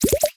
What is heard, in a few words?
bubble pop up alert notification; mixkit